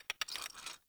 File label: Foley Winch Release; Enemy; Nimrod